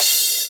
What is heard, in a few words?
clash C